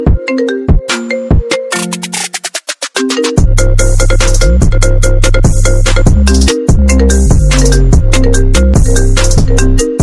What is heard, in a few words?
iphone ringtone